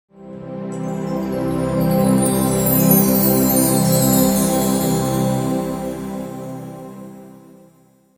Music transition - Synthesizer pad, with bell tree; Hollywood Edge Sound Designers Tool Kit